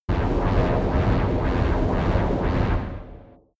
space inside biomedical alien spaceship working heavy